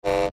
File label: Sci Fi Buzz Dirty Short; Tech; Blastwave